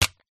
leisure toy gun shoot puff air

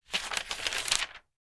paper magazine page turn; sound effects one

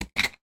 ice chunk scratch surface with chisel; sound effects one

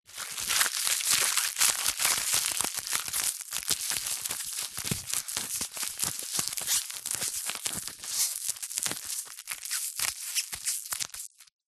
office sticky tape scrunched up handling; zapsplat

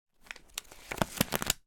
paper pack flick through sheets; foley

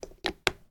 household aftershave bottle lid on; sound effects one

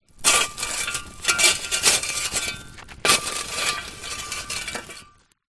industrial shoveling hot coals fire; zapsplat

